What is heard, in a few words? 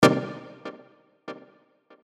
Minor Arp